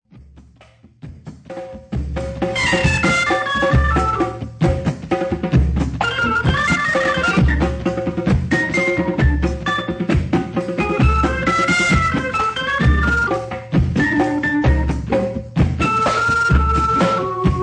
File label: Soul Drums